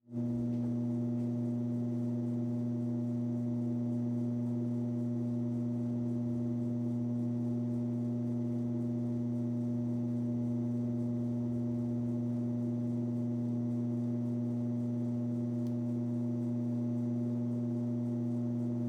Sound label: Household Treadmill Run Empty